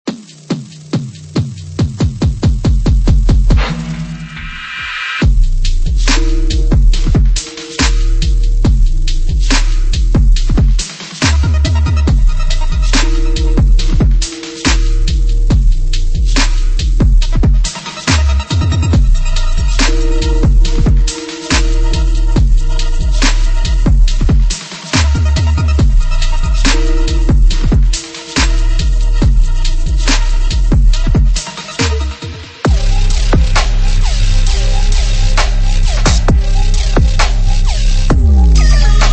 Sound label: Dubstep Sample Pack; DISRUPT